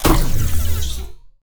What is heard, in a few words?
sinematic neon designed biomech large; soundmorph